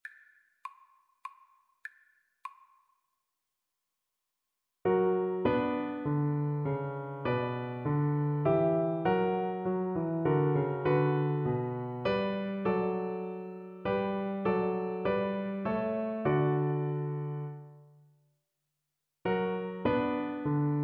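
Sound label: Minuet VLC karaoke, Cello; Purcell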